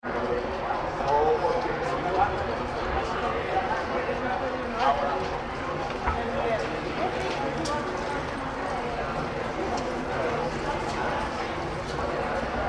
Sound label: Europe Spain City Centre Pedestrian Walkway Crowd Walla Forigen Voices; CBD; City Sound Effects